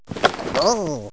Ui Upgrade Bat; Rabbids Big Bang; Android iOS Windows gamerip 2013

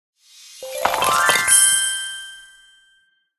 Piggybank Claim Goldbars; candy crush saga gamerip

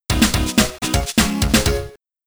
Grassy Plains Jingle; Whizz; Amiga

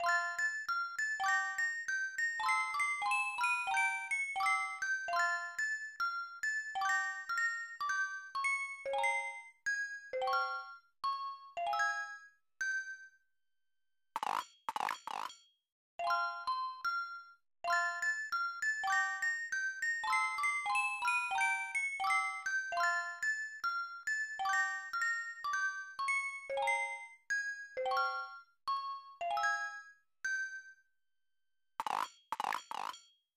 Music Box; pac man 2 the new adventures 1994 snes

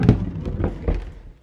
bowling roll

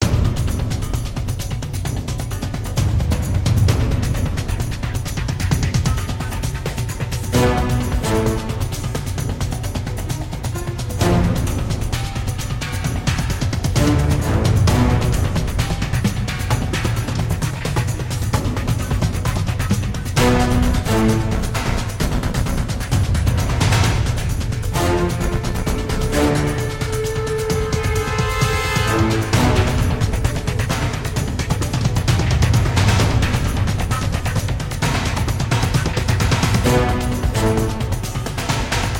Escape Mission Ambient BGM

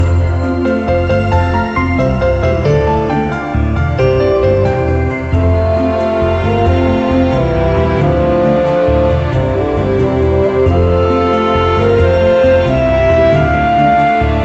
Music Menu; Gardenscapes; NDS

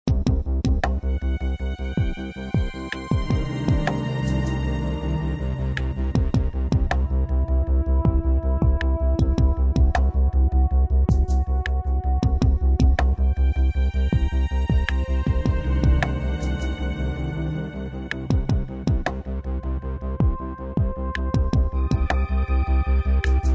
Level Music; Sableye's Gem Journey

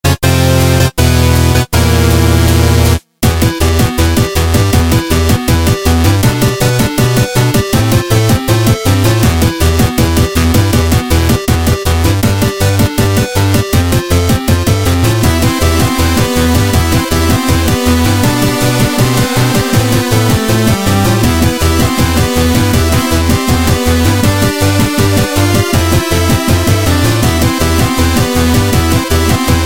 music lich battle; Dash Quest; android

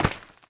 dirt

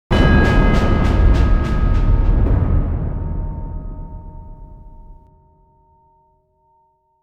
Intro short

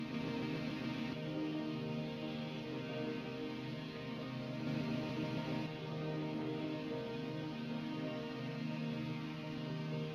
Tension Synth Augment; nova 111; ps vita

